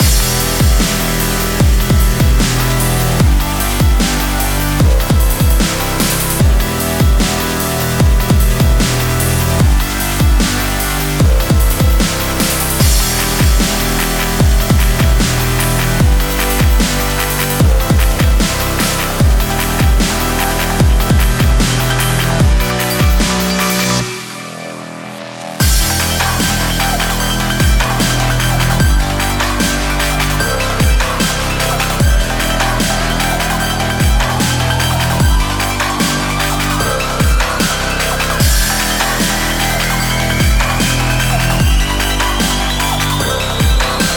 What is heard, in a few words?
action sport dubstep loop